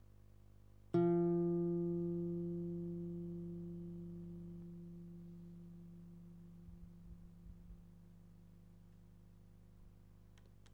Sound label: guitar